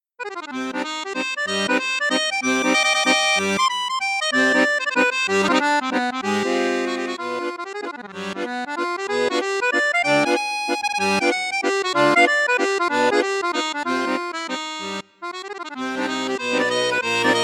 registre flute juste, coope armoniche diamante accordeon; coope armoniche diamante 805

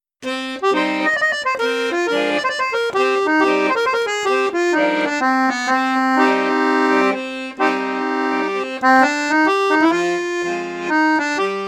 registre flute juste, accordeon; crucianelli super king

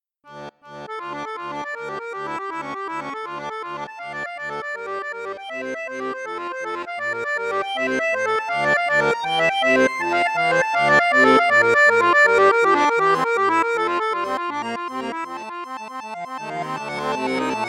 registre basson piccolo, accordeon; prelude bwv851; hohner morino artiste vi d

